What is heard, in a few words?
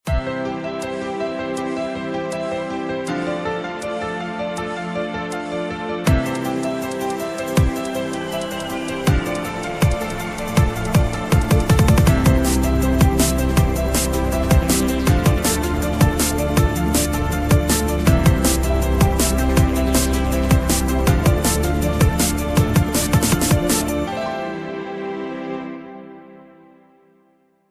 JINGLES LOGO INTRO ADVERTISING Good Morning